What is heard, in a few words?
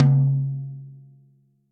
RACKTOM JAZZ stick center; RACKTOM SR 1209 JAZZ stick center; Ableton; Factory Packs; Drum Booth